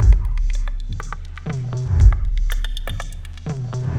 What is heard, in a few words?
Drama Drums (120BPM); musicradar